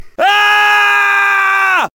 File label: Ahhhhhh